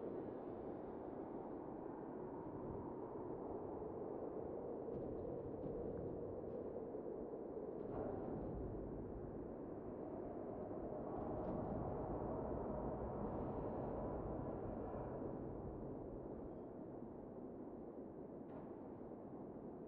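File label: interior closed room; Titanfall 2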